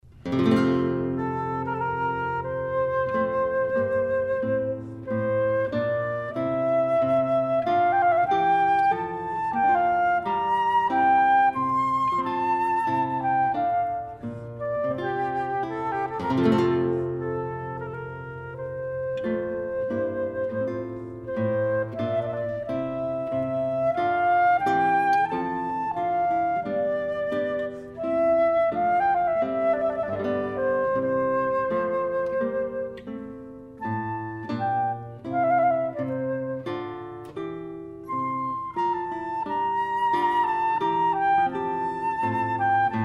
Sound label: sonata in f; DuoCantando; handel